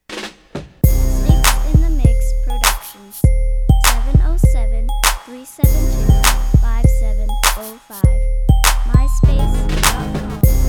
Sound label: crunk